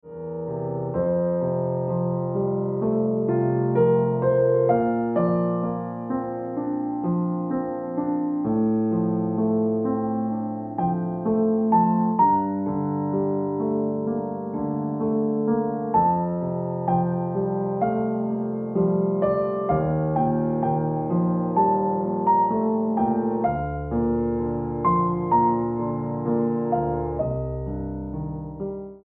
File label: Piano Music